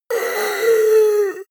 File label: monster inhale; kreaturen